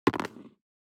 DICE on Cardboard, Throw and Roll, Metal, 1 One Die; Samples; ArticulatedSounds; DICE Sfx Party (Extended)